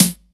Snare Acoustic, drums; One Shots; Quark 2

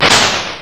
Splash Attack

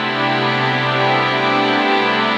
Strings Amin7

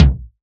Tom; Drums

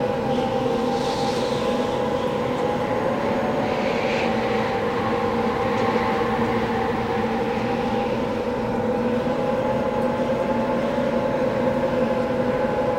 Mountain Stair Wind Tunnel Loop; Journey

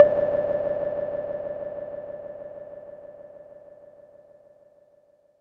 Blop Hi; FX Hit; Drums; Ableton Live 12 Lite; Core Library

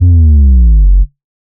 Synth Bass Sub F

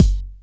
Kick; Ableton Live 12 Lite; Core Library; Drums

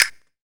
Clap Finger, Drums; One Shots; Ableton Live 12 Lite; Core Library

